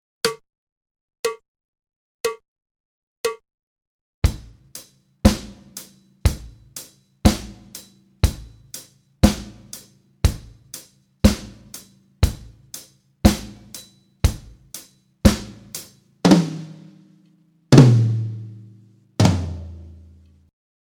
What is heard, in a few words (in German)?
Uebung, schlagzeug